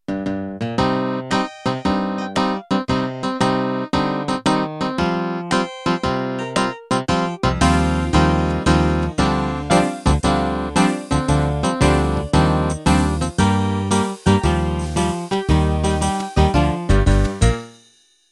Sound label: Country Ballad Intro